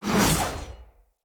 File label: Knight Axe Whoosh